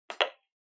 Intercom Door Station Speaker Noise

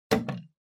Intercom Button Talk

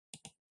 PC Mouse Far L

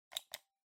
Clock Alarm (Button)

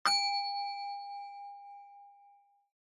Countdown

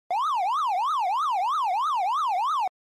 Arcade General LFO

